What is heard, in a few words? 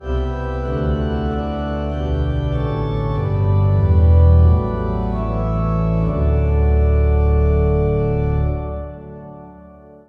organ; cornish; Hymnal 1982